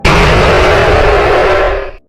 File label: jumpscare sound; nightmare at charles 3